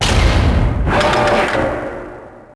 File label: bank vault door slam shut; Max Payne; subway